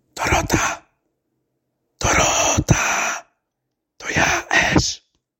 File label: pssssst